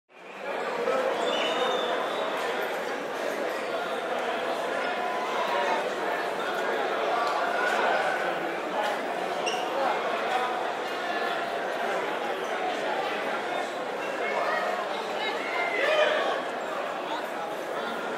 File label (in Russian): zvuk hokkejnoj areny